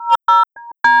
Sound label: gps lock; kismet